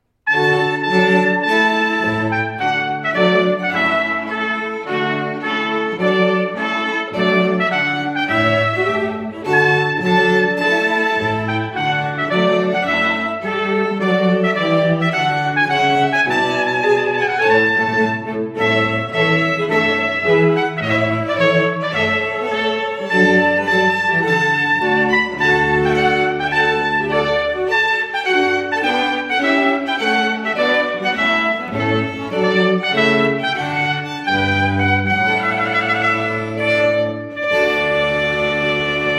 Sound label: Trumpet Tune